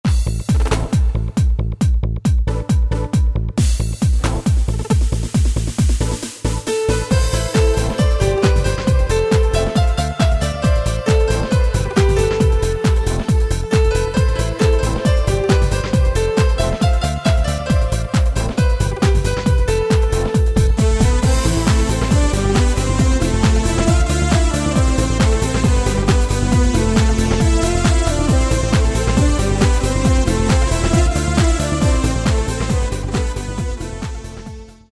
Blue Trance, Music Finder Styles; Yamaha PSR-3000

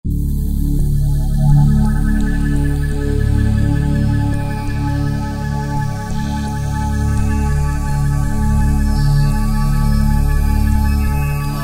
Vox Morphing, Korg TR-Rack Combi

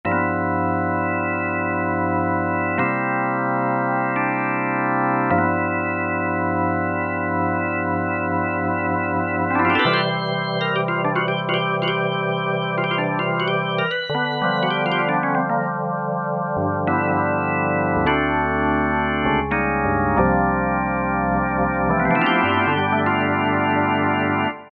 Key, Alesis Micron; Factory Programs; Zorgan

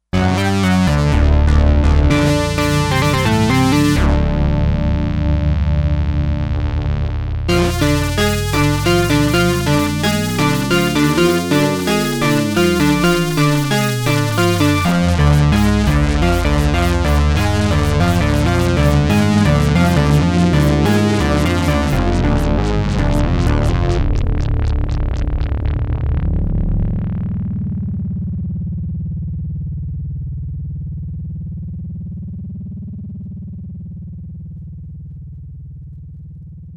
Stack example, Sequential Circuits Six-Trak; Stacks

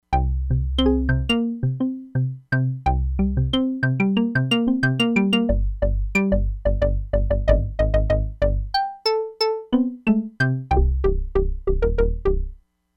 DIGITAL PERCUSSION, Crumar Bit 99; Factory Programs

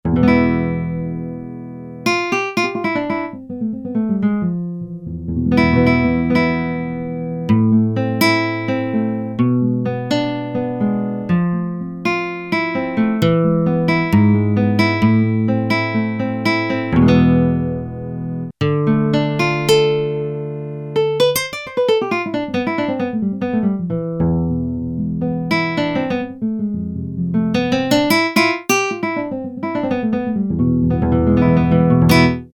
GTR Nylon String; Roland L-CDX-01 Rhythm Section Instruments

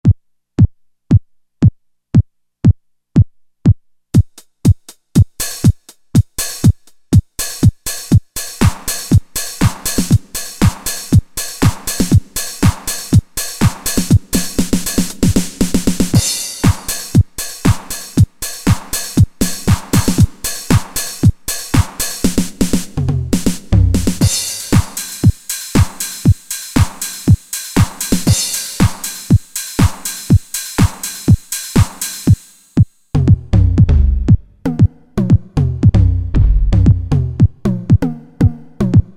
TR-909, Factory Rhythm Sets, Roland SC-880

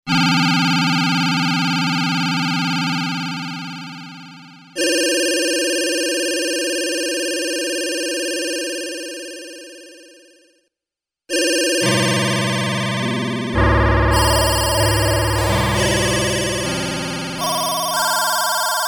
SPACE INSECT, Korg DW-8000; Factory Patches

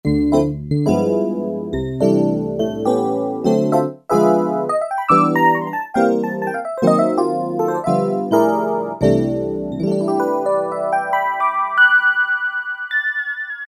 Rhodes, Kawai K1m; Factory patches - I banks

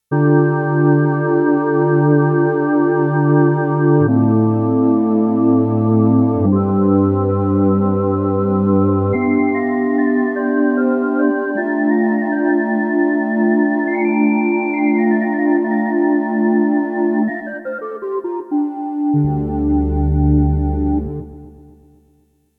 Roland JX-1; EPILOGUE

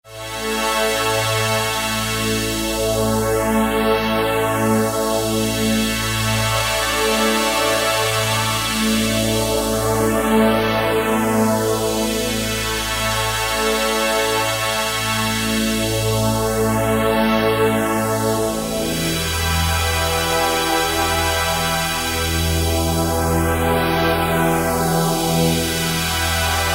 Solina Phaze, Kurzweil K2000; SYNTHS; Kurzweil K2000 Value Pack; Extra Programs

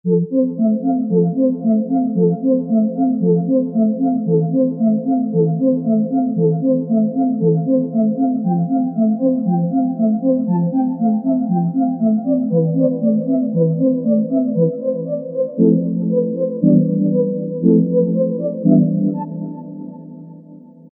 Soft Seq., Generalmusic Equinox

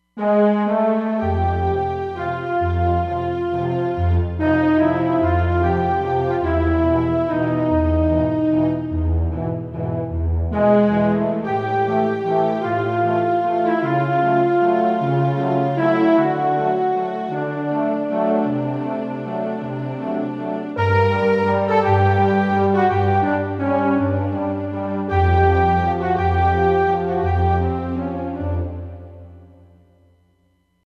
Soft Orch, Roland Fantom XR

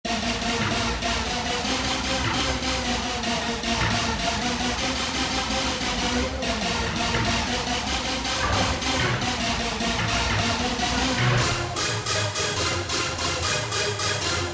Predator, Techno Collection; Roland SR-JV80-11 Techno Collection

